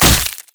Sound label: spider basic attack; Elise